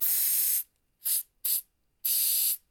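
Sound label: HAIRSPRAY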